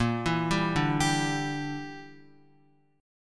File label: Bb 6th Flat 5th Bb down Guitar; Bb6b5 arpeggiated